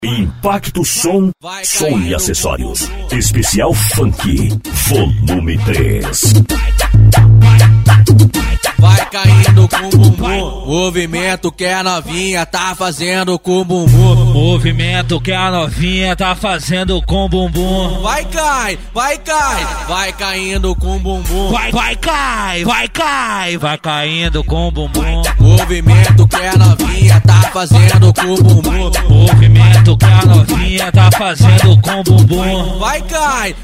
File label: funk bass; impacto som